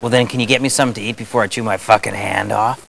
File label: eat